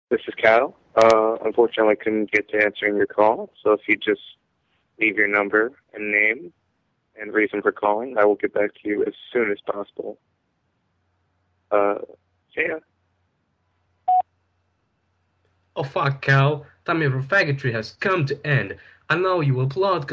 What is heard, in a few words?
rap